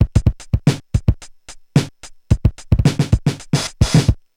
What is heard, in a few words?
Korg MR-16 (110BPM), beats